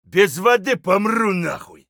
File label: dehydrated; Escape from Tarkov